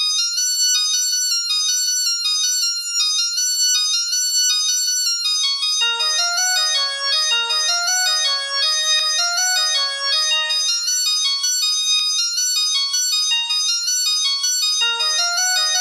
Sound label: Laridé; Becker LeTenneur